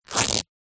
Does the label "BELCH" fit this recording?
No